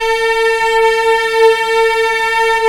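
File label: arco cello; Vcs5 Arco nv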